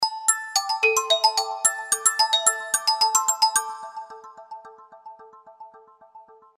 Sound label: Bell, alarms; Infinix A88